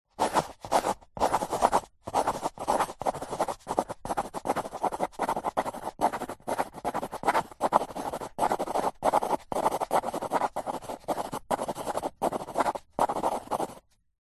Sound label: pencil writing fast on back of pad of paper; office